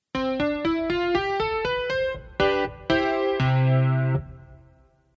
Electric Grand CP 70

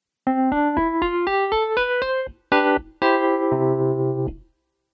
Pianet M

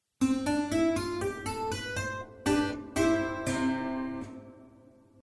Clavichord

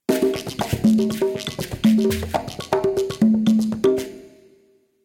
Rumba Ensemble

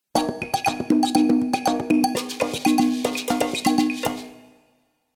Salsa Ensemble

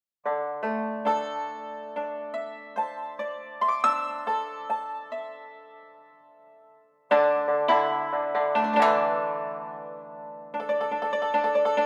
YANGQIN

